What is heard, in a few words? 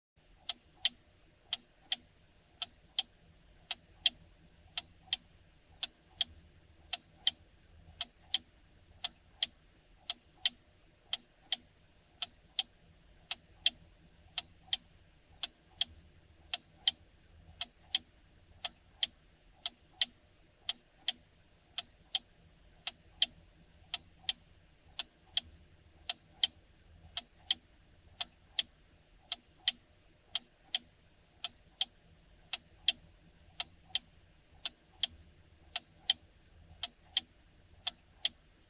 Mantel Clock, Medium Perspective; mantel ticks